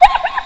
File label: wolf damage; Fight; HitSound